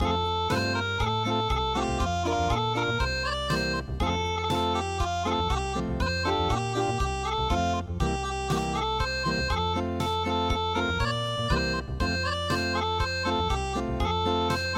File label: Acoustic; goldfish